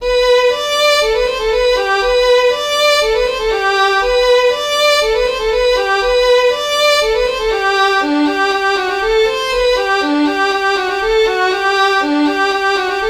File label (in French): polka piquee